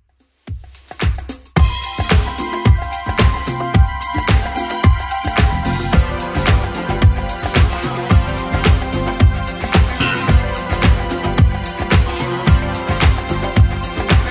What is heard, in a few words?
80s Club Edit; 110pm; remixplanet